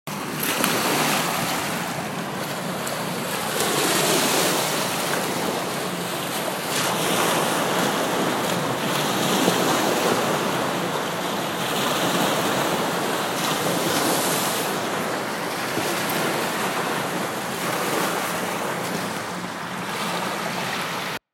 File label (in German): brandung